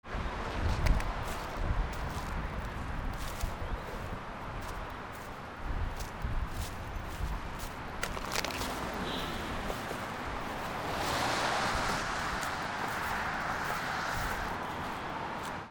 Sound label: FOOTFALL